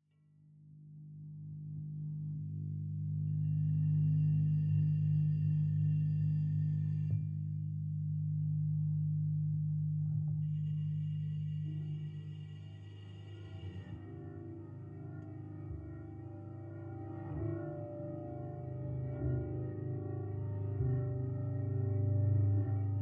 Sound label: instrumental, instruments